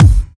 natural steel kick drum sound F sharp key, Royality free kick one shot tuned to the F# note